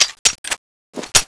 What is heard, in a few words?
gunkata reload, weapons